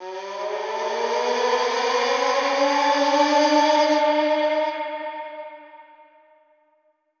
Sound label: Echo Proc, alarm siren, 125bpm; musicradar